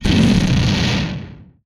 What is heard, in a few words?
Explosion; Space Blasters2D